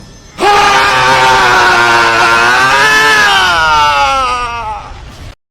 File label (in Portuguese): gritando